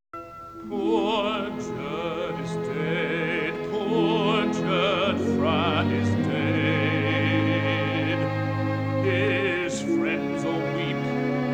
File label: BASS with vocal